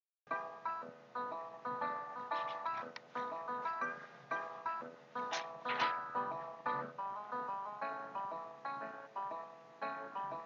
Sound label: blues picking